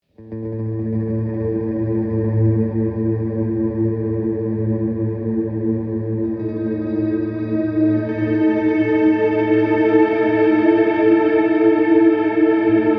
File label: Big Pad Generator